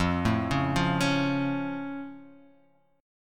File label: F Augmented F down